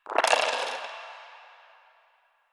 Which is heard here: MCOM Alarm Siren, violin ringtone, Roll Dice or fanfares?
Roll Dice